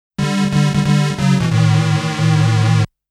Bonus Game Defeat; fatal fury; Failure is the Key to Success

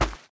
grass; anna

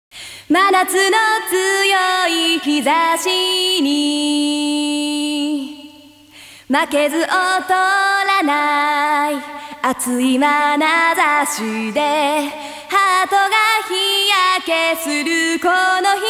karaoke bgm

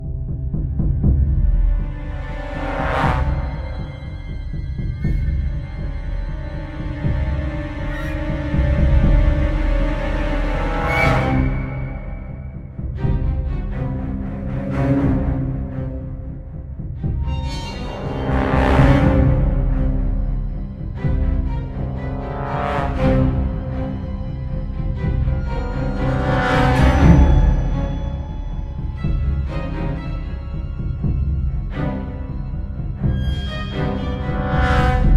High Tension; Nancy Drew: Legend of the Crystal Skull

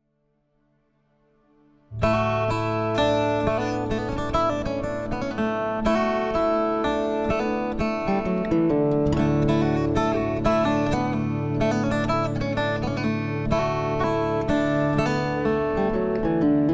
Ambient Idea; The Lost Legends of Redwall; The Scout Act III

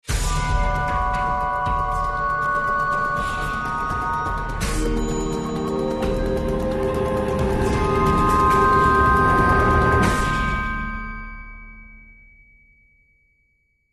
Action

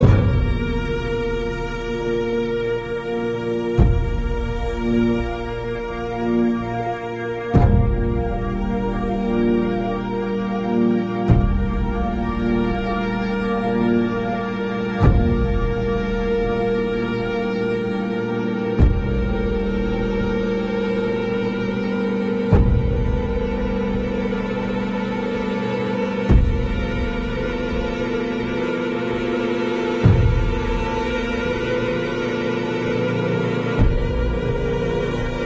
loop Tense

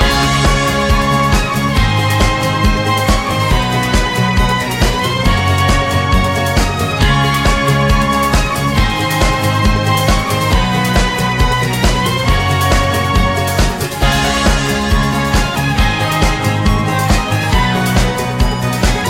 Loading; The Sims 4

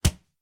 Player Hit; Granny 3; Android

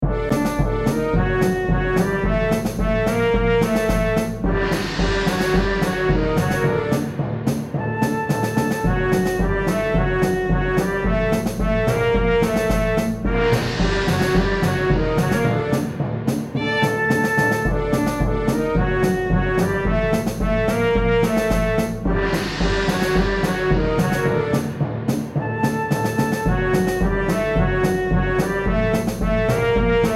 Desert Overworld (Orchestra); dragon fantasy book i the volumes of westeria

